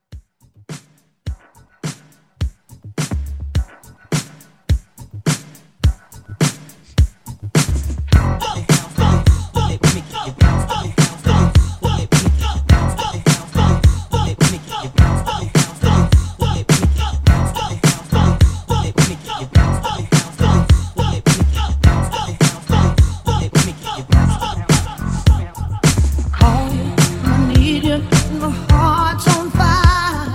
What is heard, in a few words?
80s Pop Re Drum 105